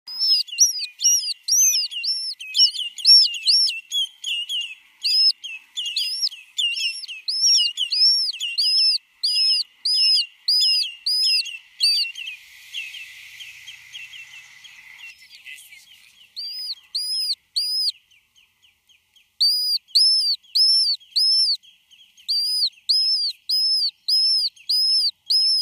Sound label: lesser yellowlegs chicks